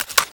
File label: magin, mp40; weapons